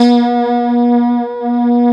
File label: ESQ 1 DIGI, Synthesizers